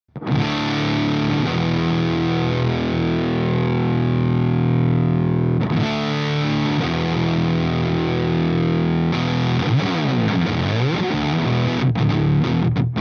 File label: FUZZ